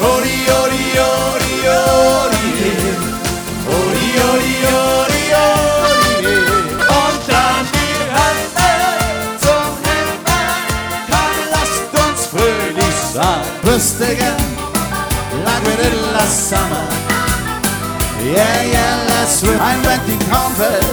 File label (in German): schlagermix